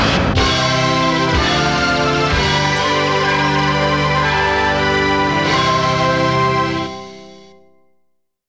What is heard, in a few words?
Repel (CLEAR); Dark Seal II